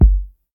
kick drum single shot g key, Royality free bass drum single hit tuned to the G note.